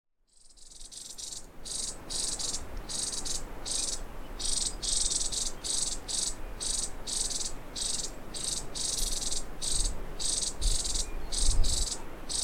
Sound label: oreothlypis gutturalis, Aves, Chirripo; Paramos; historia natural; Galeria biodiversidad